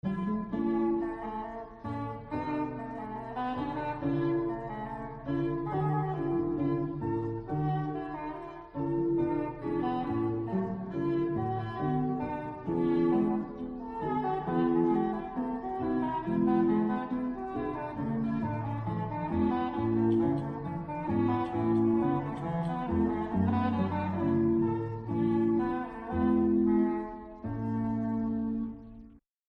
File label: Oboe da Caccia